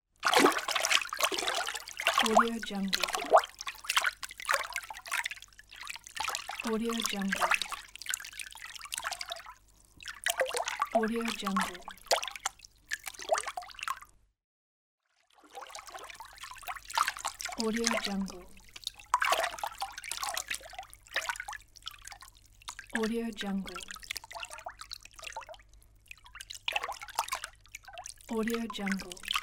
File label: water